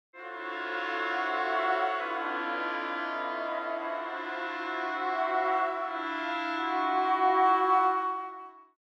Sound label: Traditional High Ensemble Cluster Stereo Waves; VENTO